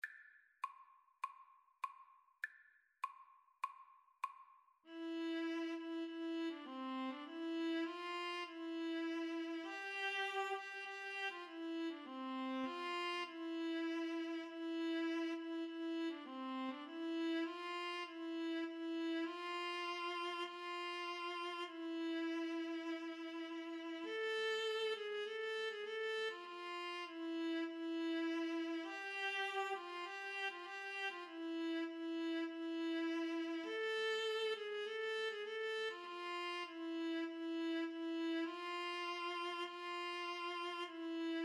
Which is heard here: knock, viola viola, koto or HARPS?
viola viola